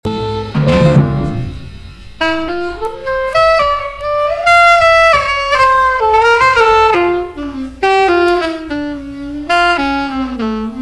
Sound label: Tunisia Break